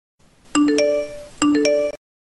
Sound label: raw ringtone, rock